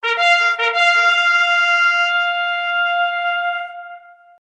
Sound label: Horn; Rohan; LOTR